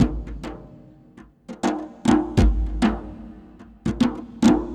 Djembe; Sonic Foundry (Sony Creative Software); Ambient Atmospheres & Rhythms

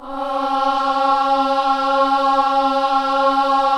KIDS CHOIR, Gospel

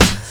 SD, Dance Drums

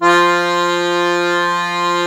DIATO1 F2, Historical Instruments; USB Soundscan vol.23

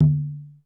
CONGA L, SY99 KIT; Crypton - DrumBase5000

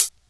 CHH, Dance Drums; Best Service ProSamples vol.15; AKAI

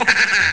ahahahaa; ST-XX Amiga Soundtracker Sample Packs

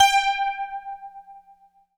STICK BELL G4, JX-10; Greytsounds VOL-1

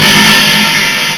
SEQUENTL-TOM; Rubber Chicken Software Sample Bank; DRUMS + PERC

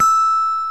VIBES BELLS

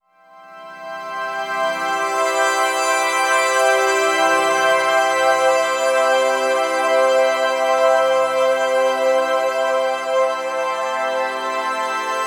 Long Hi Complex, Complex Wave

